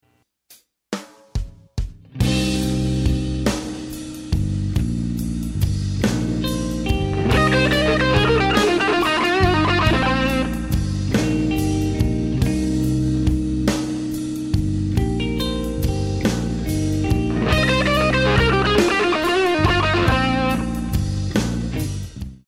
C Minor Blues Lick, guitar; 19 Blues Licks

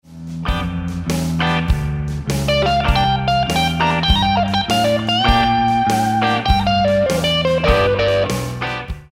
Reconsider Lick, guitar

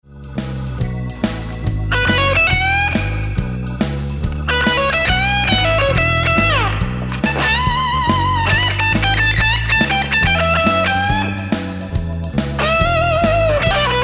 Lick, guitar

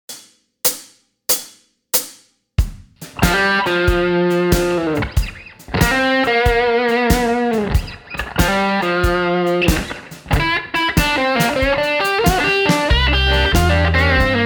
Blues Style Solo, guitar

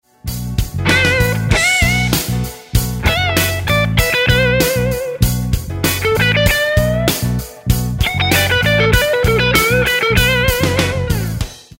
Funky Lick, guitar

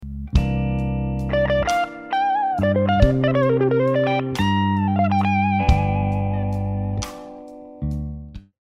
G Slow Blues Lick, guitar

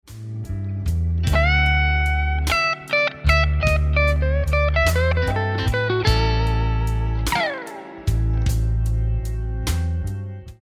Lick, guitar; Beginner/Intermediate licks; Blues Guitar Method 1

